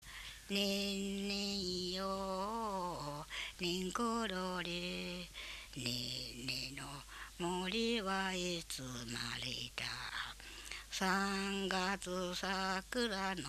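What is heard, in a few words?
lullaby; minyou; komoriuta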